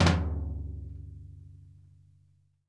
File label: FLAM FLOOR, TOMS; Sampleheads; New York City Drumworks VOL-1; SP TOMS